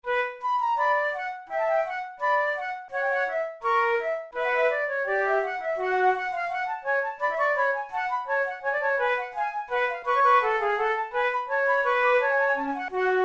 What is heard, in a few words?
in b minor, flute